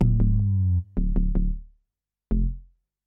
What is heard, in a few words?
onda fone bass